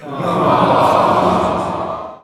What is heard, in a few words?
crowd groan; soundboard